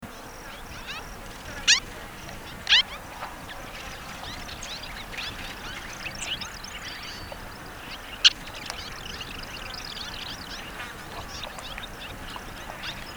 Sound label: Orange chat